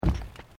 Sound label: Footsteps Boots Plate Metal Step; Footstep Foley; Footsteps (Sound Effects:Human)